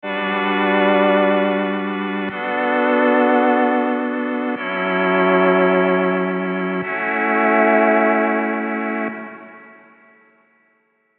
serum pads; chiqui audio

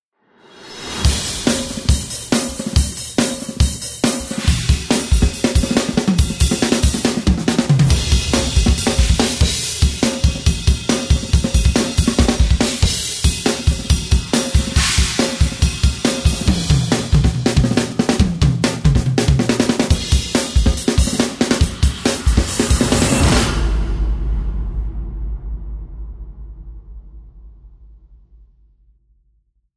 rock drums; platinum

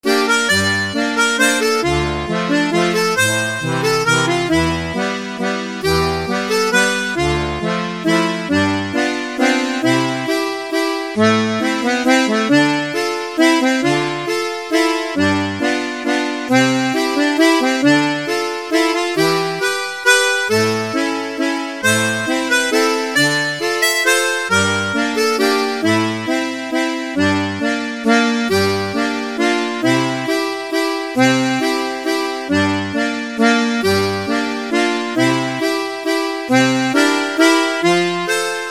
chemnitzer concertina

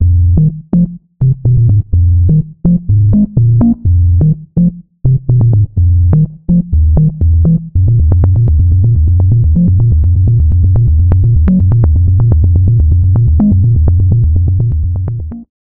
bass percussive sub

